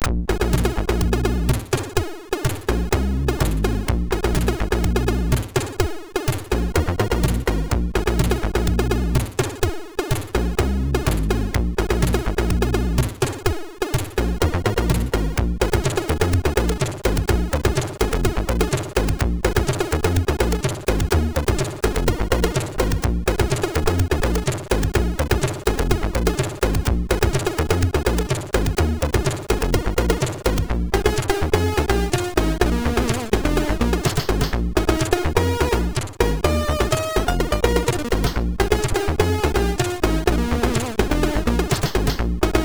My Funky Beat sid MOS6581R4

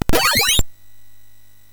FX, MOS6581R3; HVSC; Software House

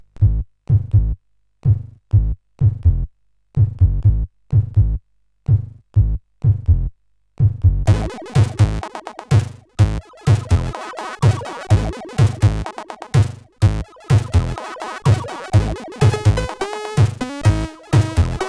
sid, CSG8580; 90 Seconds; hvsc

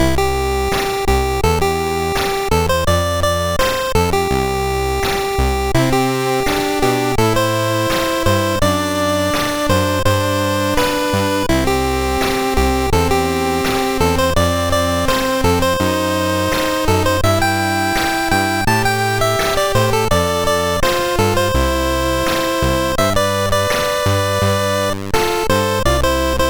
Final Synth Sample, MOS6581R3

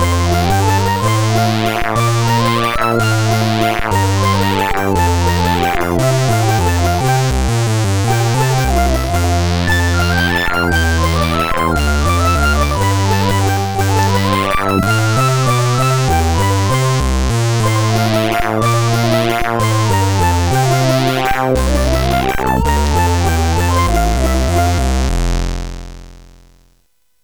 Menuet, CSG8580R5

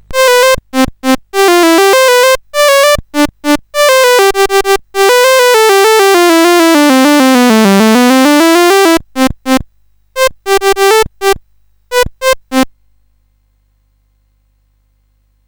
Chopper, CSG8580R5

